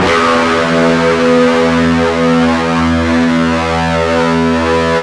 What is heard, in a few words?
FUZZ E 1, Guitar; East West; Steve Stevens Guitar Samples Collection